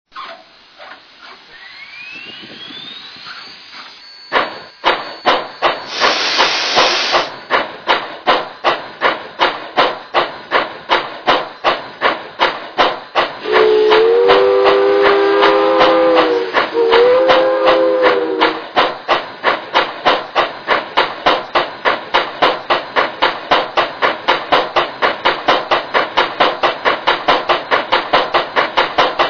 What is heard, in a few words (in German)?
Tonmuster Mogul; LGB